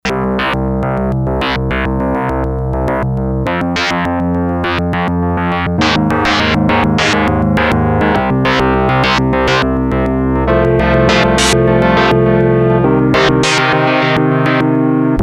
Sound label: S-H Synth, Yamaha DX100; Factory Patches